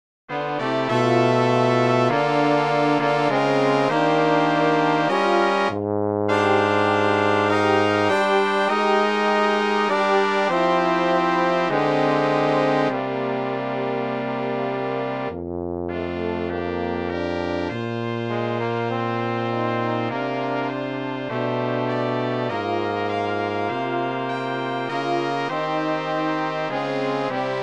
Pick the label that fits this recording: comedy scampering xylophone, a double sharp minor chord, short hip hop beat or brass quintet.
brass quintet